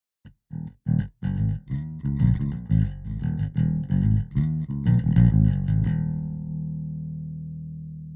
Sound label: bass; SSL X-Gate; tekitou